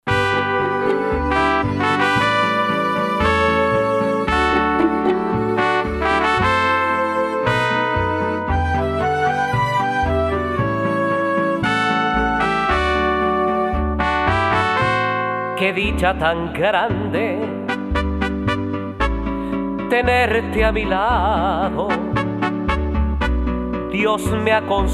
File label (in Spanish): BOLERO VOCAL; FESTIVEGAS 2012; folkloricas